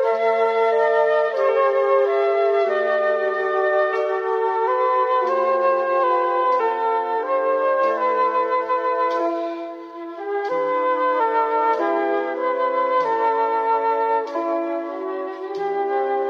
flute, Loops, Sad

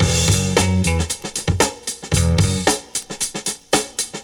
full, Loops Phonky

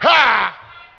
ha, ooh ahh

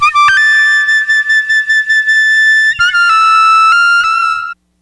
flute, Sad; Loops

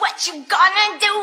what you going to do SUNG

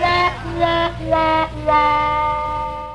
fail horn

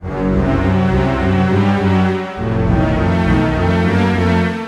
strings ensemble, Sad; Loops

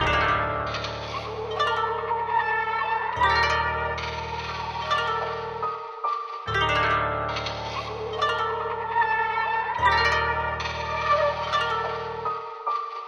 guitar, Sad

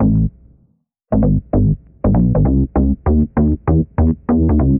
bass new jack swing, Loops Phonky

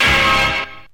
shatter, stabs

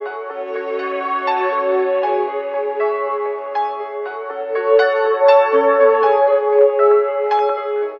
piano, Loops Sad

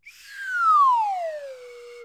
whistle down

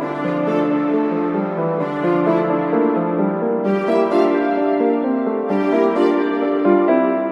piano strings unison, sad; Loops

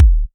Kicks Electro

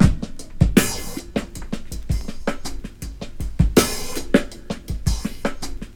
incoming zaps, boombap drum loop; Drum loops

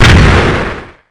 gun shot; Crash Bang Boom

